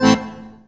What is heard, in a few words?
ACCORD HITS; Best Service; World Colours